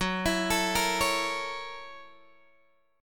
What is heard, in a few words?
F sharp 7th Sharp 9th, Guitar, Open G